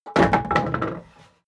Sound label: wood board single drop; objekte; holz